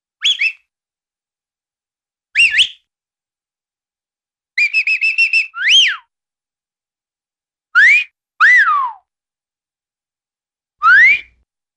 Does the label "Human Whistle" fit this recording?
Yes